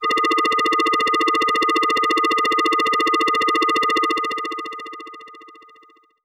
Helicopter FX DX100 G5

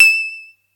atari 520 st

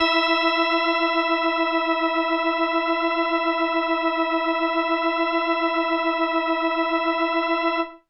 Indie Organ Kawaii E3

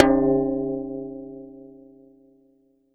Tom

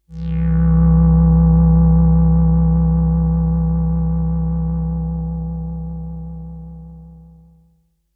SYNTH

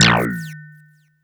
Sine FX

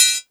Ride Cymbal

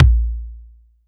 BD

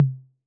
Tom Mid C 808

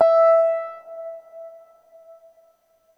soft tines E4

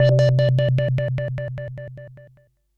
SYNTH Beauty Bell; Bellio

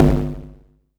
BD

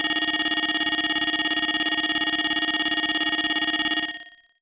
Alarm Call DX100 B4

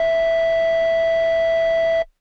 Air Bass Kawaii E4; keys

